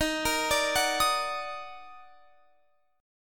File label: D sharp Minor Major 7th, Guitar Open F; arpeggiated